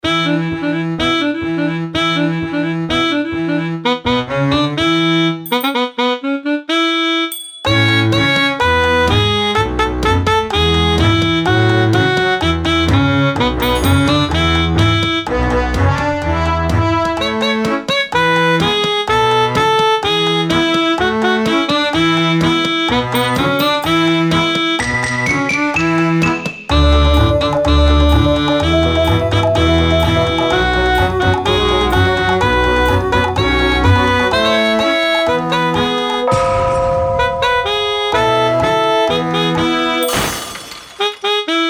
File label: instrumental; film and theatre; klackalica; Veliki i mali